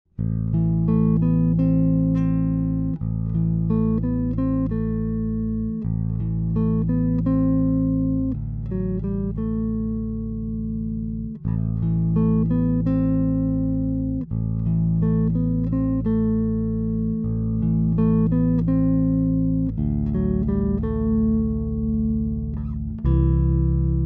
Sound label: active hiboost chords